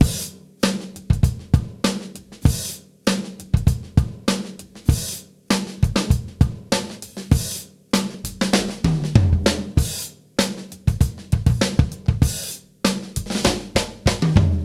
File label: back beat, boombap drum loops